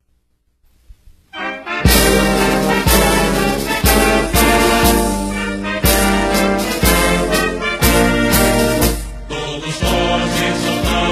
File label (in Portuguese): hino de roraima cantado